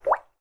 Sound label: turn off bubble